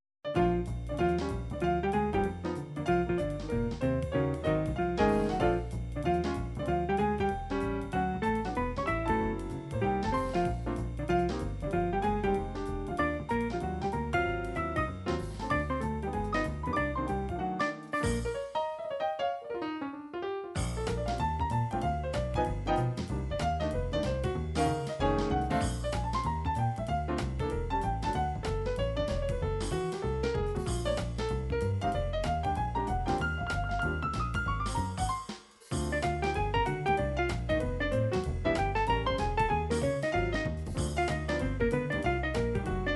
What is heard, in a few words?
jazz bop